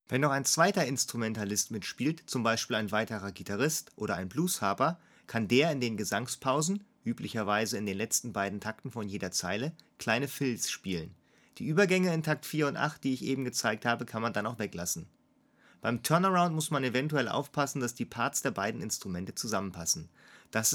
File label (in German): Standard Blues Riff in A mit Fills